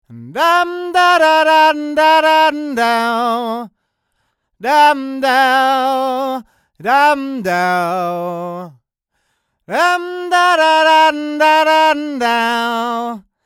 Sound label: Neumann TLM102 S2 near